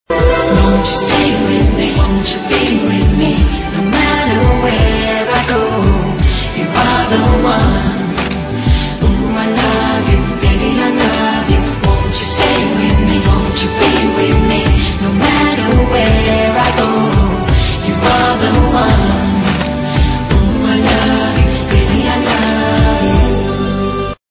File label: ring tone